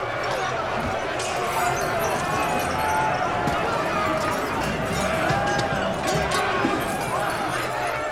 riot

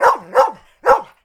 dog bark angry

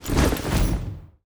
march turnaround

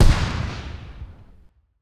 tyr explosion

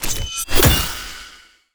magic sword

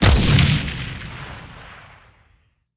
rifle

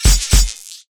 light sword dual

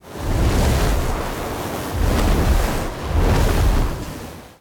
sand monster move

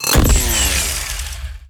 sharpsaw

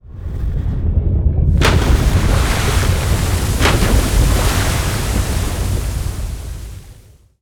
sea city surface

